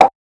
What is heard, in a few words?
Hats, Alesis Performance-Pad